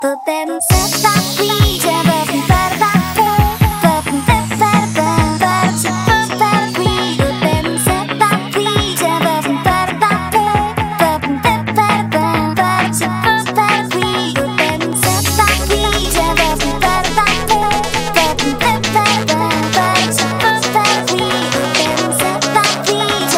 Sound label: Music Song